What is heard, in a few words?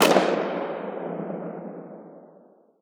aug distant; weapons; Counter Strike Model Pack; CS2